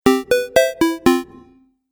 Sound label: Game App Little Tune, Video Game Sound Effects